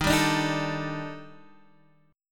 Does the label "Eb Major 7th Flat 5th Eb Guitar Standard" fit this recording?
Yes